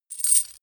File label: metal chain jerk